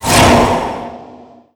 satyr soulstealer manaburn, weapons creep neutral; dota

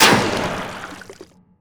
poison attack; viper

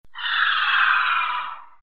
bird death defeat; dota; phoenix

